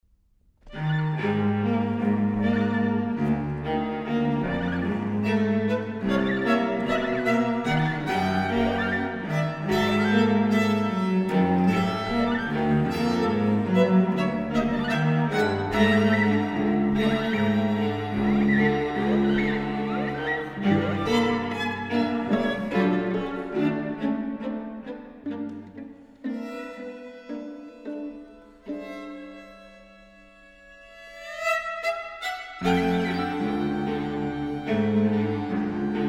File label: microludes for string quartet